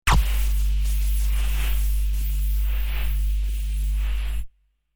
Injection Pump, Futuristic injection pump sound.

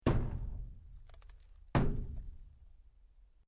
PLASTIC PIPE LARGE STRIKE; Material components for constructing more complex sounds

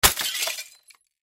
Crash Glass Smash Crash Object; Crash Glass Smash Crash Picture Frame; Smashes (Sound Effects:Objects); Blastwave

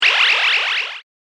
Imperial Blaster Gun, laser gun sound effects; science fiction sound effect; star wars / trek style games

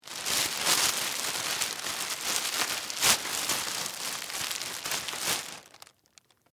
Garbage Bag Tie, Household, Garbage, Bag, Tie

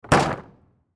Dumpster Exterior Lid Close; Lids; Foley

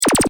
Laser loop, Laser: Firing; Lasers

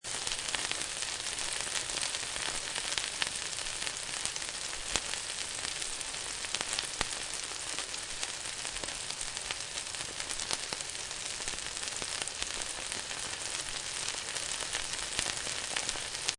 Frying Loop; Frying and boiling; Kitchen, stove with frying pan and pot boiling water; Lynne Music

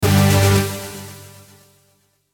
Hit Heavy Synth, Hit Heavy Synth Delay; Blastwave

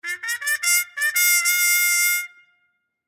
Trumpet Mute Charge, Trumpet Mute Charge Cavalry; Brass Sound Effects; Blastwave

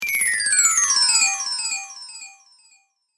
Chimes High To Low Delay, Windchime

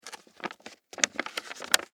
Office Envelope Manila Open; SFX Bible

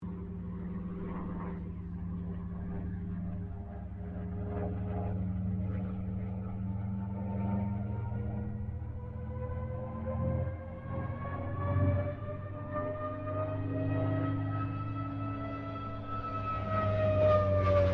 AIRCRAFT CA-13 BOOMERANG PASS STEREO

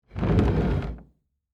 Table Slide Back; Foley; Backward, Wood, Movement, Short; Slide Sound Effects; SoundEffects-BlastWave

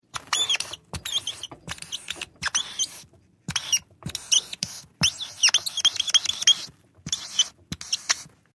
Magic Marker, Magic marker squeaks; Pens; Offices

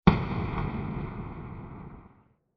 Large Bright Explosion; Explosions; Crafted Signals

